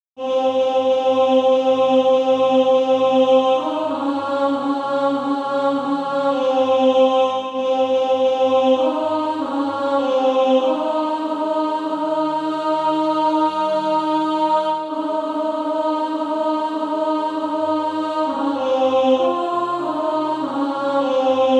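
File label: alto, Sheet music (SATB) including Practise Music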